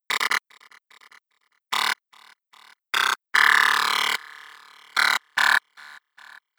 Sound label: Energyball demonstration laser nocutoff